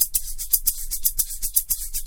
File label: strawberry shaker, Drum loops